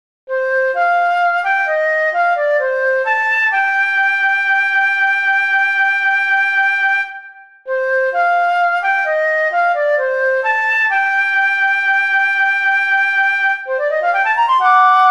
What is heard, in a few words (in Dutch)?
Dwarsfluit